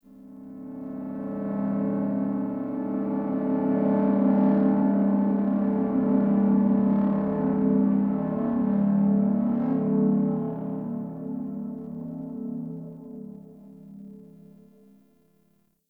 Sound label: Gritty Pulsing Drone; Warframe; Ambience; Nineteen Ninety Nine